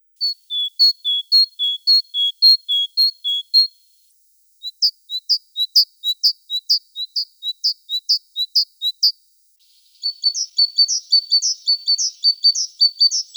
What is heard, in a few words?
Parus major